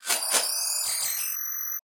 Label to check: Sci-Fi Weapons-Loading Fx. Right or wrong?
Right